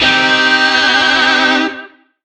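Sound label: Shawzin Pentatonic Minor Chord; Warframe; Lizzie Shawzin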